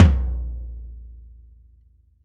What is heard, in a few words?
low mid tome drum sample a sharp key, Royality free tom one shot tuned to the A# note